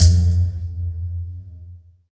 SYN PIZZ, JD 800; Sound & Vision - Gigapack I CD 2; JD 800/990

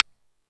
RIM, Yamaha DD-6

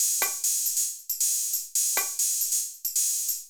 Beat, Old Skool House, 137bpm; Belfast

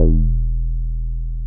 WARM E1; Boom da Bass 2; E-MU Producer Series Vol. 6 – Analogia Project

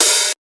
Old Skool House Open Hat

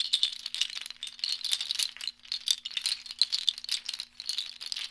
Abstract Pod Loop, ambient twinkles

